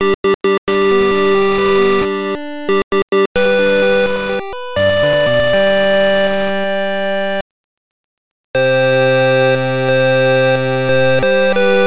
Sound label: Wedding Bell; Lufia the Legend Returns; gbc